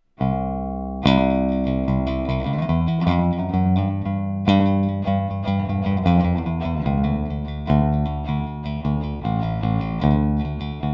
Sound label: HIGH TONE AMP, Zoom 506 II